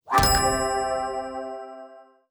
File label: Magic Score; HintsStarsLite